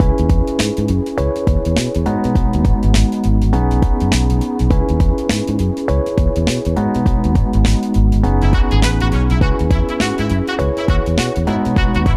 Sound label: City Opening cue